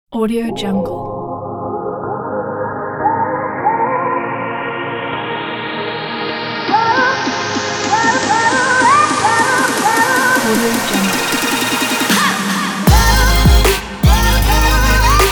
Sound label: future bass